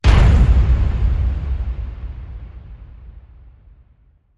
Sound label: Hit Big Powerful Weapon